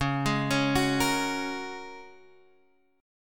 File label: Ab 7th Suspended 2nd Suspended 4th, Db